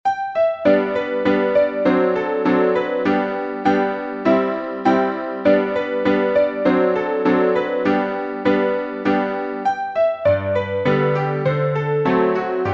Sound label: piano, sheet music